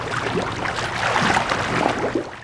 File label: wizard water ball